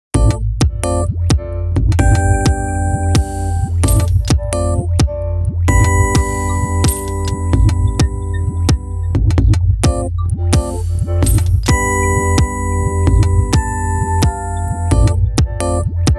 Warped, Nokia Tones; Windows Phone apps

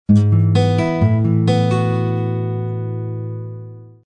SWEET GUITAR, ringtones; Axioo M5C

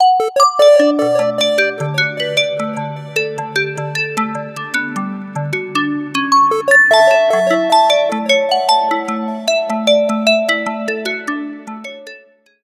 Echo, ringtones

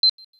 camera shutter crystal; Samsung; GT-C6620

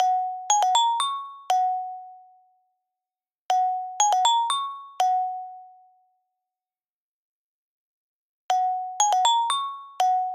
shimmer, ringtones; Surface Duo

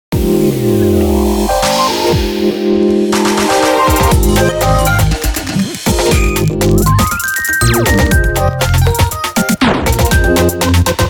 Ring; Lumia 1320 Southeast Asia

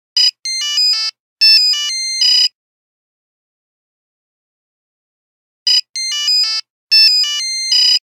Nokia Buzzer Robo N1X; Windows Phone apps

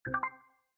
Charging Started

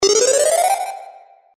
Climb Calendar, 8-Bit

